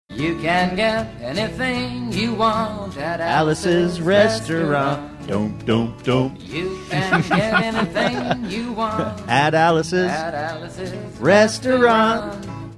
singing along

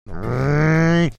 making growl; marge simpson like

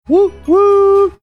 whoo whoo

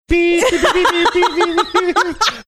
making series beeping sounds

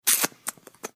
lip smacking

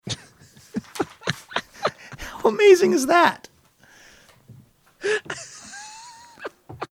laughing saying how amazing is that

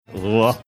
disgusted sound

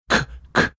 making beanbag catching sounds

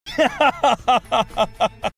laughing

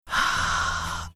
exhaling

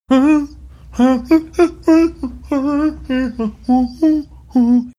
singing like manner

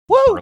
whoo

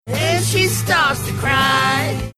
singing and she starts to cry

